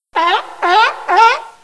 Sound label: Sea Lion Barking Sounds Car Horn